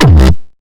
bass kick

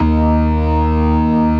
STOPS, Synth and Organ

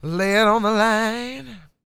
SING W, Vocal Planet Blues & Soul, BLUESY; ILIO

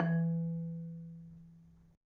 Marimba, E1; Terkelsen

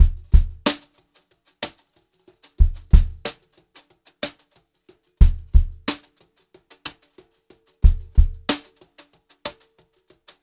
brushed drum loops

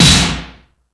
Percussion FX, E-MU Pro-Cussion

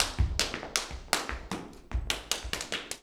Flamenco, ZAPATEADOSOL

